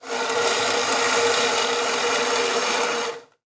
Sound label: Extension Cord Retract; Sound Effects Bible